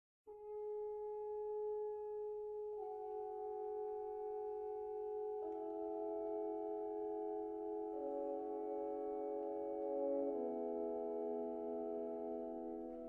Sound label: String Quartet Non troppo